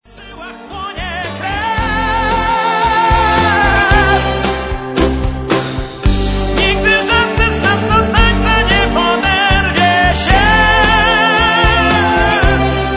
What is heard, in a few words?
tango